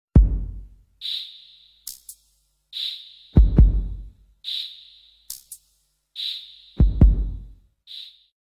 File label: Heart Beat